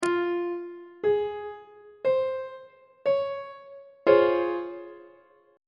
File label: c sharp major 7th 1st inversion; treble clef